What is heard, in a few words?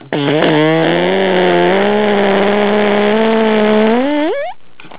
chainsaw